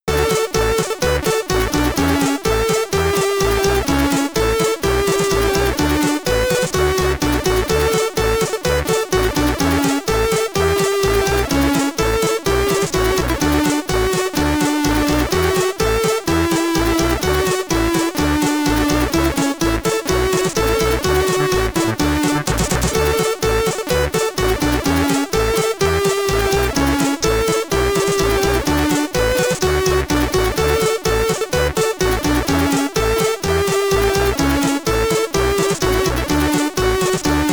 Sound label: SID Stereo, commodore 64; Eskimo Games